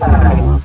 shock trooper pain; svencoop; cryocell

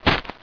box squash

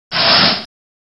small flinch; rheptor

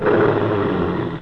bear pain; wizardwars

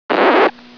record; svencoop; toast

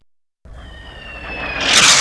boom splash; svencoop; central bunker

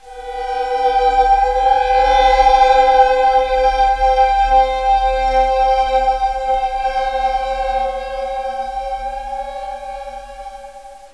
atmosphere; svencoop; trempler

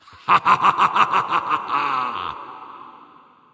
Horseman Laugh; svencoop; keenhalloween; horsemen

